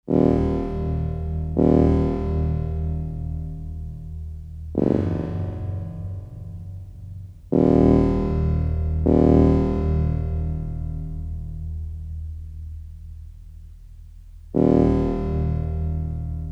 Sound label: Interior Rocket (Suspense Music); The Wheel in Space